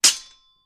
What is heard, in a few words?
Sword Clangs Sword, swords